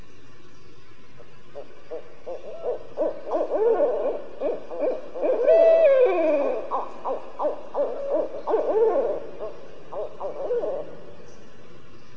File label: Barred Owl